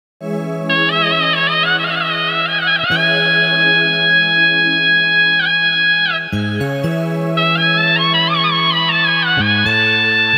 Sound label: piano midi keyboard; kalia; vst